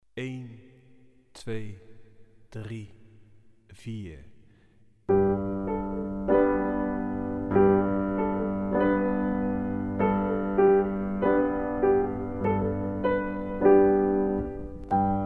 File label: tune slow